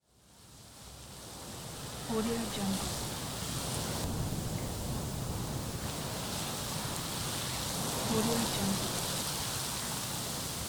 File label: lava flowing